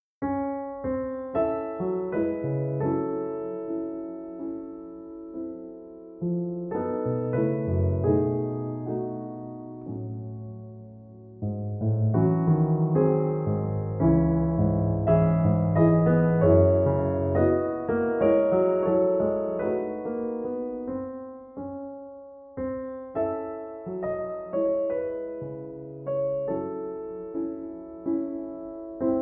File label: Prelude In E; Prelude In E Op11 No9; Alexandre Scriabin (PD)